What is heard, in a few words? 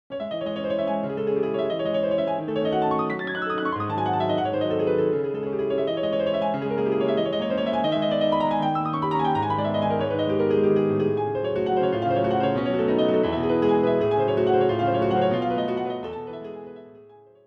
grand; plg150-pf